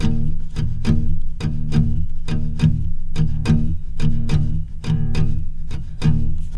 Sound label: E 2 R, Guitars, SLOW SHUF; Spectrasonics; Hans Zimmer Guitars VOL-1